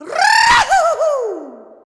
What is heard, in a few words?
"rrrrrauhuu", shouts; Best Service; Hyper Dance